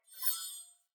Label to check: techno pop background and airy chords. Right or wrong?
Wrong